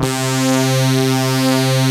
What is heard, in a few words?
EDGE SAW; Pro-Rec - Synthsonic; TRINITY